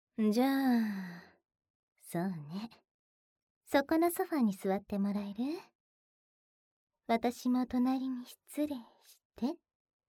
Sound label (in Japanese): 耳舐め, ASMR; お仕置き寸止め手コキ&耳舐め